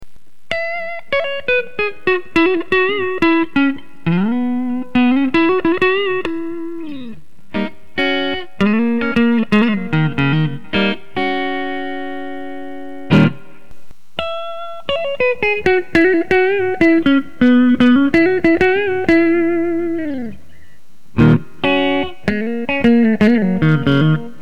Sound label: strat mod neck tones